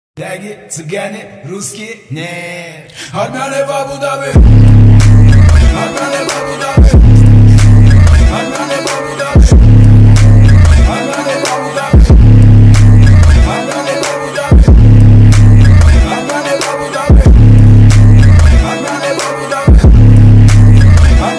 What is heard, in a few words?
remix bass